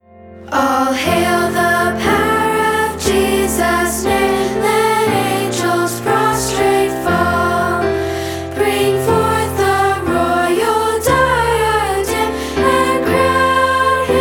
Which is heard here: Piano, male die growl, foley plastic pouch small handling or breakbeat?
Piano